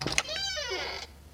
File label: Door Open